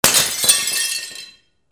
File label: BREAKING